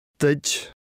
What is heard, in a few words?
Key Sounds